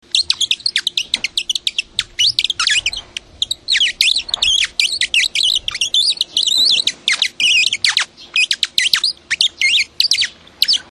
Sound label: bard call